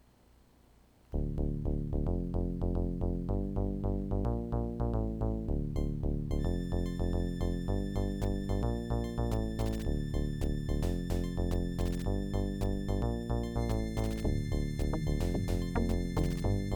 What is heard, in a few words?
Intro Adlib CT1600